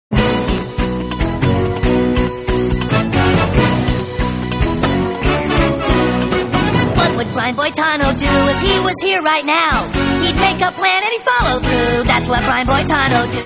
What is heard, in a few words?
music mono; 32kbps mono; Doživljaj zvuka je jednak kao u 64kbps stereo verziji